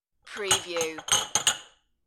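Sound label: Stack Glass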